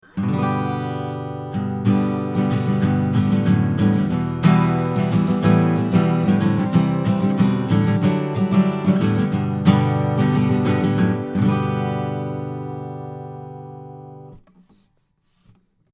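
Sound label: Schoeps CMC6MK2S Acoustic Guitar 20cm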